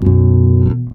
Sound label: bass 3 (F#) chord; Sonic Foundry (Sony Creative Software); Ugly Remnants VOL-2; Basstuff